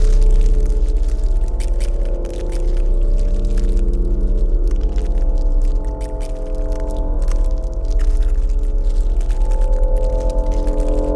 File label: pro ship science station idle; biogenesis